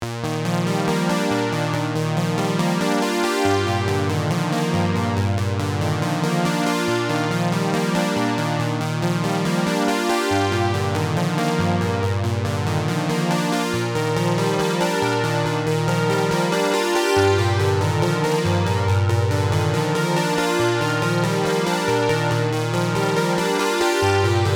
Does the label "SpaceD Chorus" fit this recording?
Yes